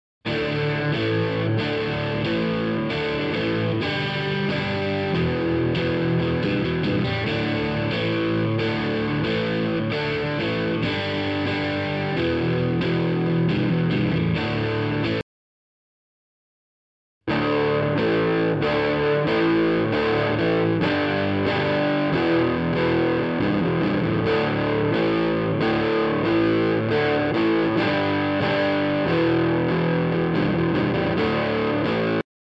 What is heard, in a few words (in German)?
Speaker SM57; Aufnahmevergleich